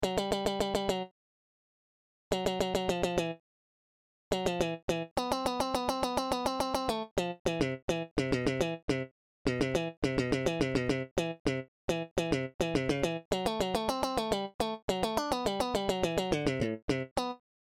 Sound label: bass solo